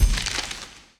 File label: UI Scroll